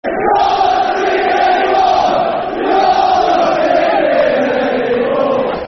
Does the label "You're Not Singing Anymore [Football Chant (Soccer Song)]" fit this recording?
Yes